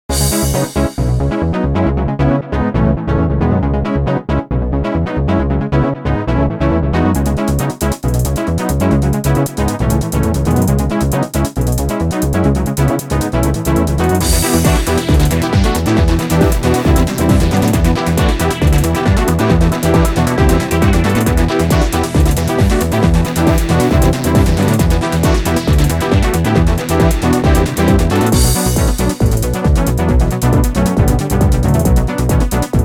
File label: Pinball, Music; Pure Pinball